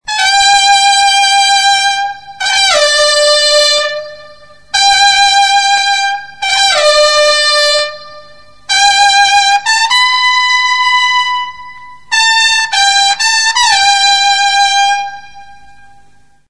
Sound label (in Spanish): tono trompetas de los toros; whatsapp